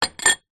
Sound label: small ceramic sugar pot place down on surface; household; zapsplat